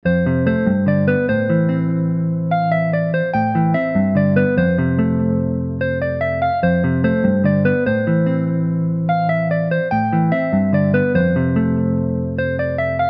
F min 146 BPM Guitar

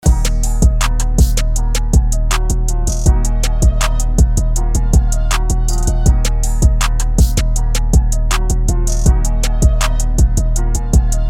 Dmin 160 BPM

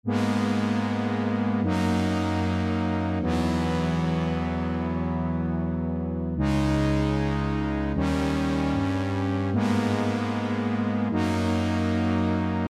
Melody Loop Gmin 152 BPM Synth

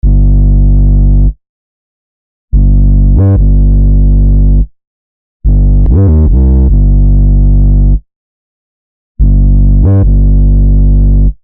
Drill 808 Gm 144 BPM

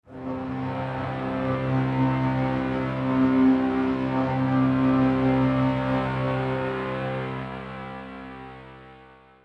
Pad One Shot

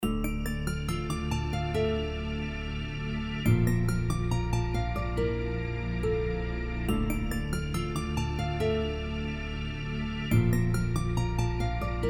D min 140 BPM Guitar Strings

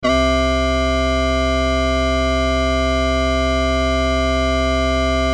Synth One Shot

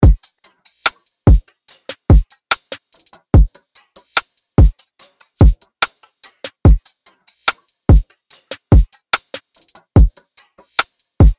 Trap Drum Loop 145 BPM

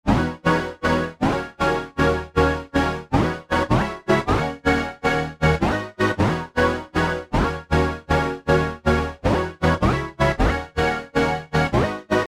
Serum Chords

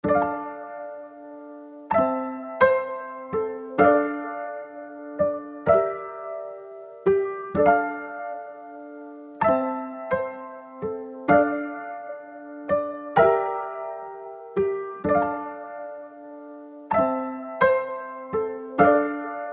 Latin Melody Loop Dmin 128 BPM Piano

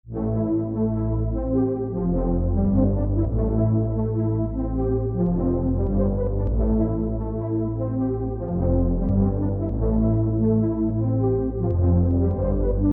Serum Pad

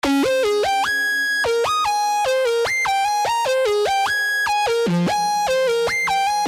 Serum Lead

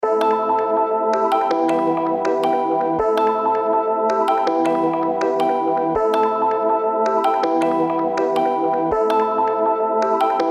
A min 162 BPM Piano Keys

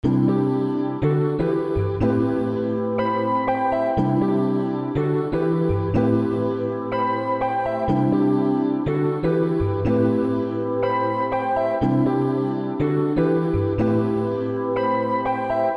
Latin Cmin 122 BPM

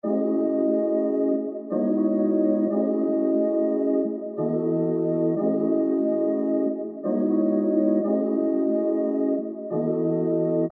Lofi Loop Cm 90 BPM Pad

